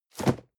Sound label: cardboard box medium place slide hard; objekte